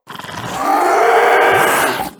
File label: leaper dead; trespasser